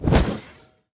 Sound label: enemy shadow attack; sfx